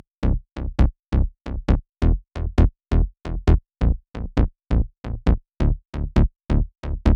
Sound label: 134BPM Bassline Deep, Cmajor; Vengeance Samples; Lovely Day Kit